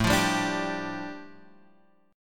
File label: A 7th Suspended 4th Sharp 5th, A, down; A7sus4#5 strummed